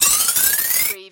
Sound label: CARTOON GLIMMER